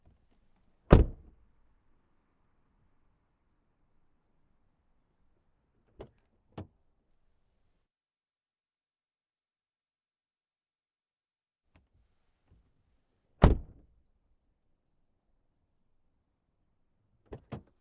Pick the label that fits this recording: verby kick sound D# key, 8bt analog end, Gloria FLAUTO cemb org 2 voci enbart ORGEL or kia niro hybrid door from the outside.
kia niro hybrid door from the outside